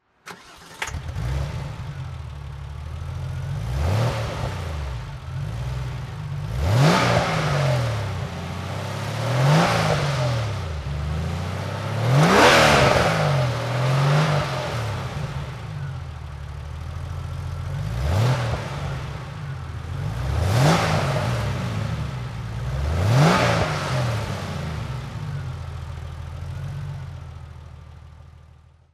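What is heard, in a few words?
porsche 993 carrera throttle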